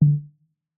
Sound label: confirm